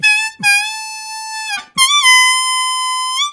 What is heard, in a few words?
High Gliss, Harmonica; Zero G